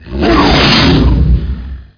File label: ROAR; CD Action; shadow